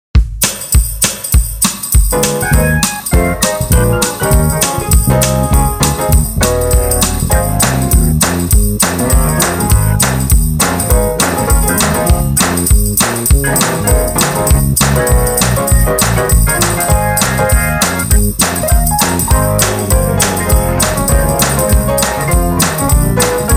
Gospel, bass; groove

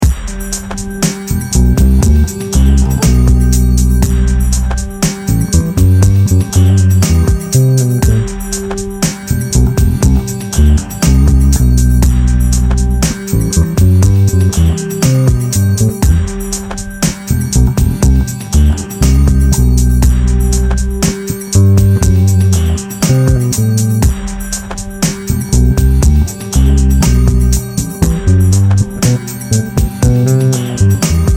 Phrygian Groove, bass; metal and non-Western traditions such as Arabic music and flamenco; flat 2; a bassist's guide to modes

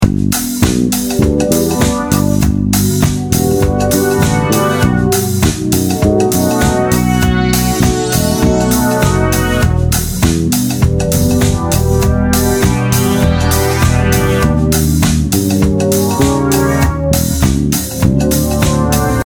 13635 G major, G–B7–Emi–E♭7–D7 progression; swapping the ii chord (Ami) for a tritone sub (E♭7), which leads smoothly into the V (D7); bass; chord substitutions

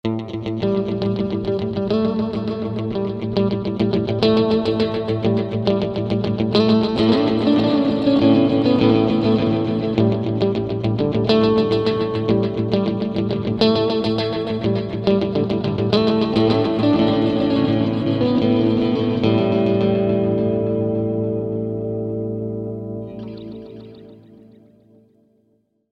DELAY

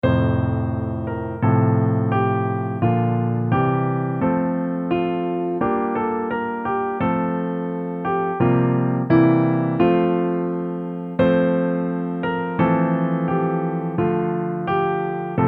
LH Chords

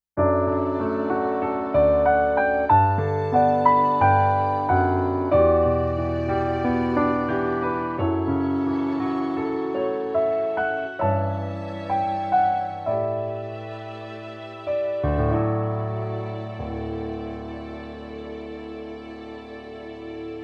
Piano and Slow Strings

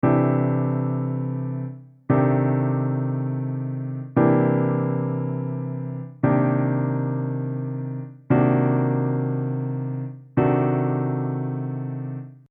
Chord Qualities

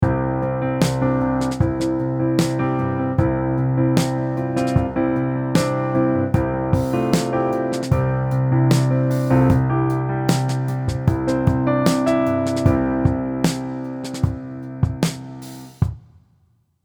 Rhythmic Chords 76 bpm

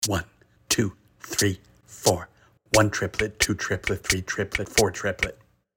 Swing Eighths counting

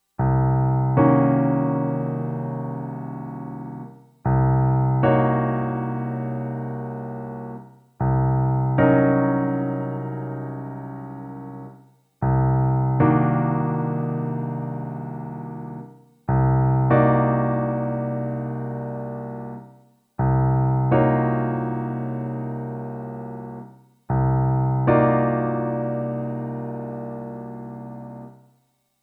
C half diminished rootless

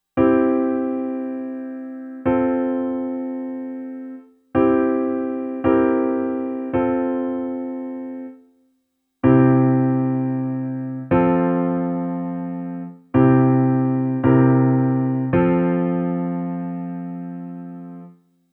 C resolves to F

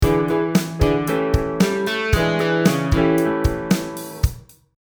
Accented Chords

